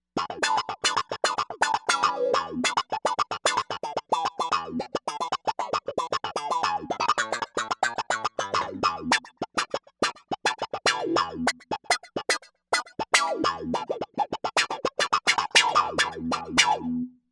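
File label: Velo Wah Guitar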